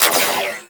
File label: Miter Shot, Weapons; Warframe; Tenno